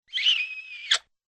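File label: cartoon kiss sound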